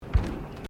Footsteps Bare feet Wooden Deck Step; Footstep Foley; Human

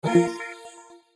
button digital sweeper; Sound Design; Multimedia

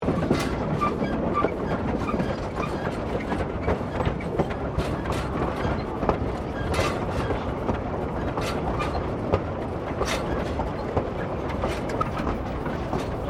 Steamtrain Trucks Rolling Loop; Bellarine Tourist railway vehicles; Trains

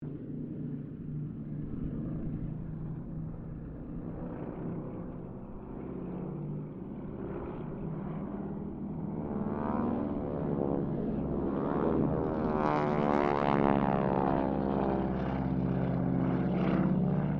Harvard squadron 4 pass, stereo

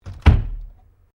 Door Pivot Interior Wardrobe Louverd Close

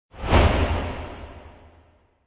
Rush Long Tail, Rushing sound; Rushing sound for video editing, movements, logos, flash, or animation; Sound Design; Rushes

